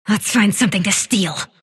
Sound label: sylph move pain; dota; dark willow